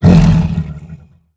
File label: Monster; Zenless Zone Zero